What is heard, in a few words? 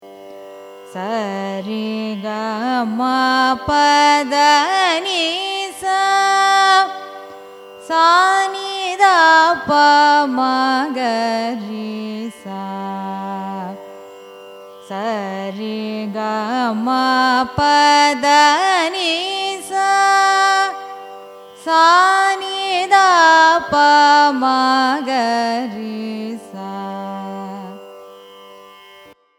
raga gowri manohari arohanam avarohanam; carnatic music